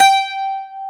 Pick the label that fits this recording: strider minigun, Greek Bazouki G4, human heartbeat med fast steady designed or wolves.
Greek Bazouki G4